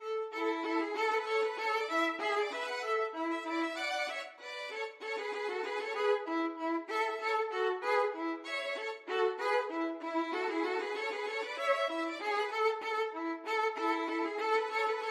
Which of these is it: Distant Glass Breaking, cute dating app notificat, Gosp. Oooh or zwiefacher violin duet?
zwiefacher violin duet